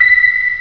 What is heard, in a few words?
ping